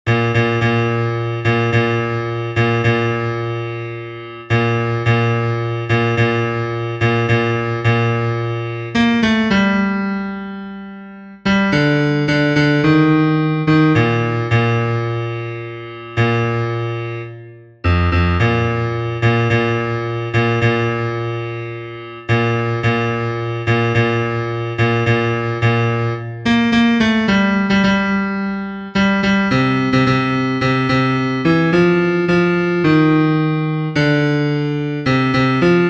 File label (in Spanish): BAJO, himnos en piano; cantos del camino